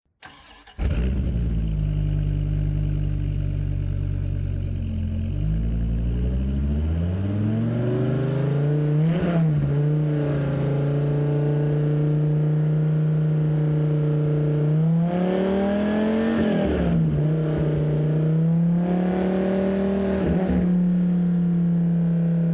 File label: viper